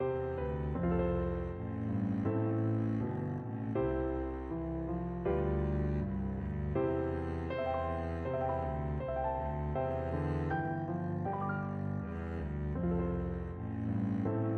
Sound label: the parting glass, Double Bass